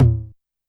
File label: Tom Analog, YAMAHA RM1X